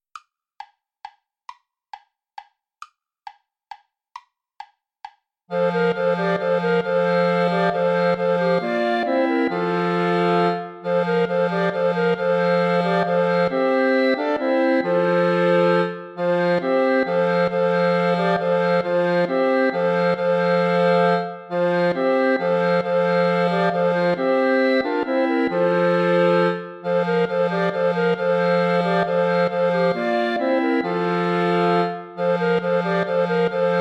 volta play along without clarinet; praetorius michael

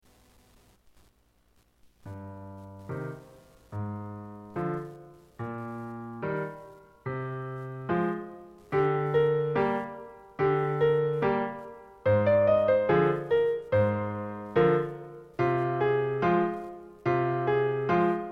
piano; mon piano hoquet

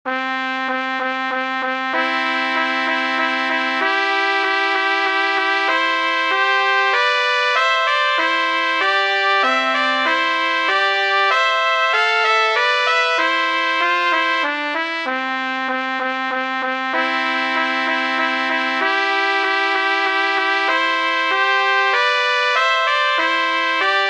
fanfare menuet; duncombe william

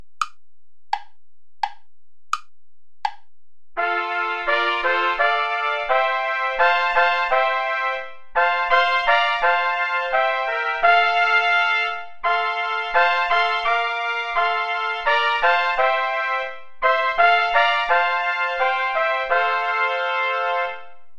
play along without trumpet

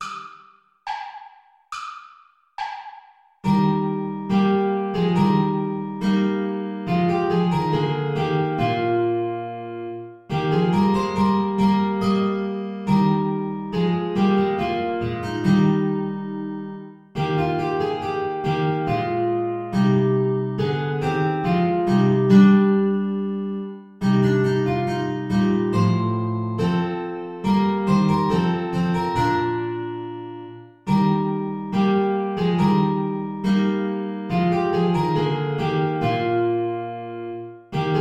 play along without guitar; haendel georg friedrich; tochter zion freue dich

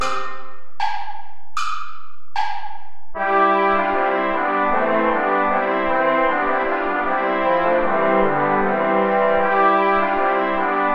play along without trombone; deck the halls; traditional

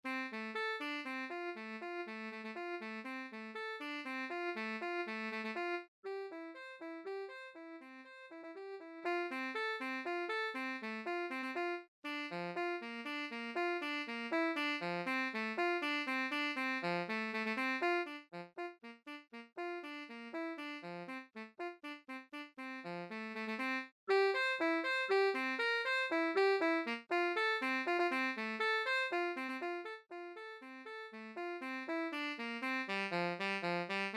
etude moderato; lewis alastair

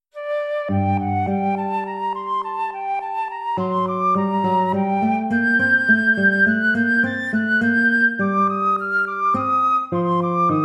courante; haendel georg friedrich

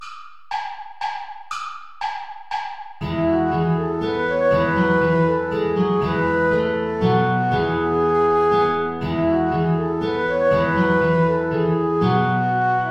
saltarello play along without guitar; praetorius michael

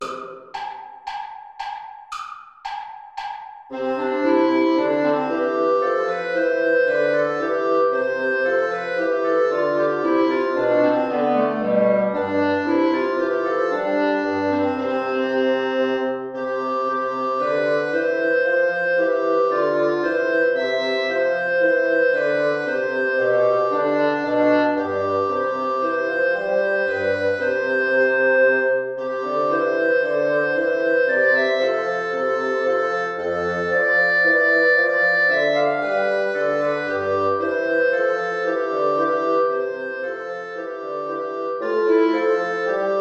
air play along without guitar; bach johann sebastian

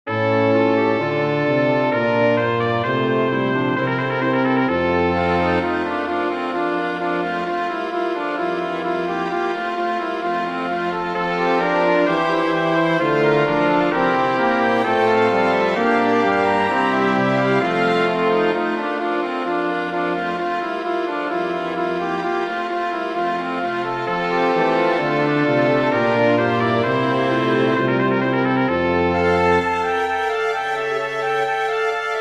chorale for winds strings; bach johann sebastian; gott frommer gott

